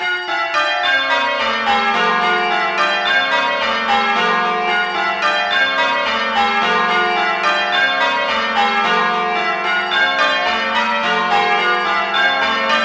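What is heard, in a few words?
true harmonic major, bells; cccbr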